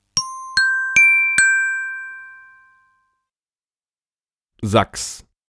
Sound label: Sax